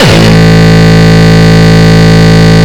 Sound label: Longkick, kicks